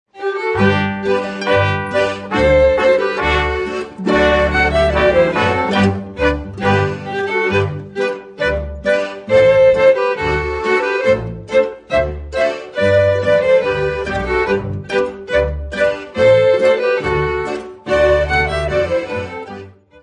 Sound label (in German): Münchner Polka